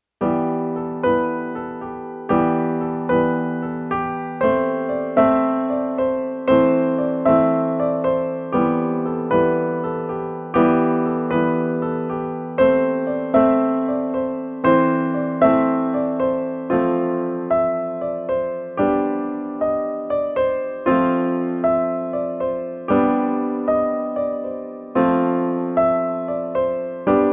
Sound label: solo piano